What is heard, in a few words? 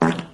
fart short